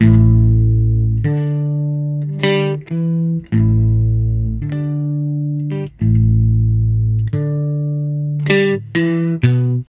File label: 80s bass guitar played in music studio